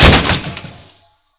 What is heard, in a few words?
damage, car; q3rally